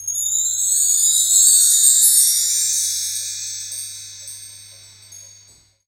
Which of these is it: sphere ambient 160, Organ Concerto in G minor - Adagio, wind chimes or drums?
wind chimes